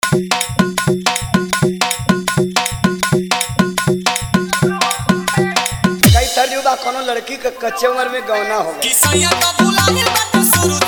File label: Hard Jhan Jhan Bass Tahlaka Mix